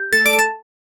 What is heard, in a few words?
chill notification